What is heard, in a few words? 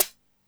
KIT, Dance Mega Drums; Best Service